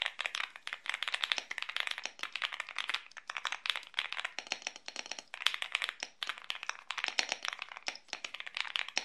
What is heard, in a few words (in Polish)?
Dzwiek Galaxy100 Lite; epomaker